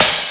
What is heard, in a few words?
Crash; osu